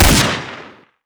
shoot, weapons

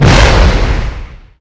flame, dragon

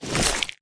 human form short move; flood

